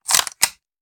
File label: Machine Gun Foley; Sound Ideas; Guns